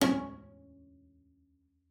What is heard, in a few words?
C#4, bass pizz; Xsample VOL-5